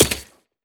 frag physics bounce mud hard; gmod; viper

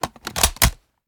sm uzulu reload empty scope mag in; reloads; iw8; gmod

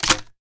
reload empty fast shells in; gmod; wfoly plr sh charlie725

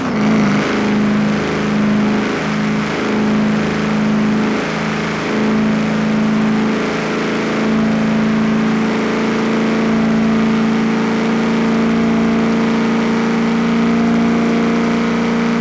fourth cruise, vehicles

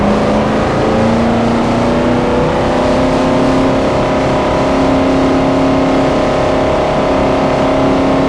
fourth cruise, vehicles; gmod; tdmcars; van

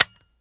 fire first; gmod; viper

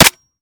weap fire last mech; weapons; gmod

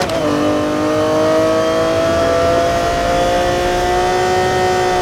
fourth cruise, vehicles; aventador

